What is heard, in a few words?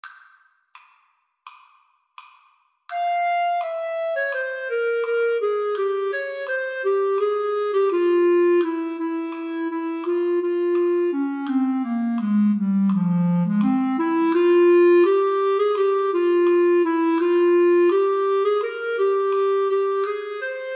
clarinet set